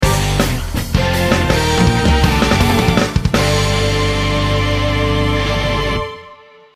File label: outro music; freak fortress 2; nue